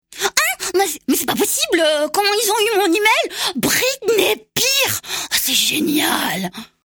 bruit

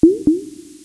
gloups; MOBICLIC 13

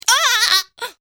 chute; MOBICLIC 72; RATOCLIC

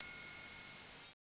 touche goutte; Arc En Ciel Et Le Lagon Merveilleux; French Version

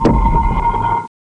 pose pierre

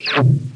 cracheur

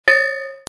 ECHEC; MOBICLIC

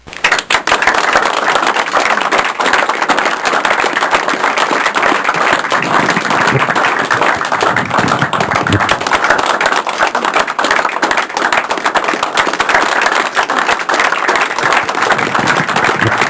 Applaudissements; Sons cartoons

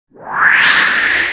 porte

